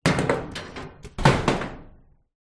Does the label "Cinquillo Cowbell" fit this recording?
No